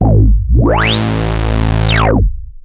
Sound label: OSCAR A1, synthesizer; Hollywood Edge - Giorgio Moroder Rare Synthesizer Collection